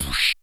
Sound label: SY77 DIGI ATK, SY77 DRUMS; Crypton - DrumBase5000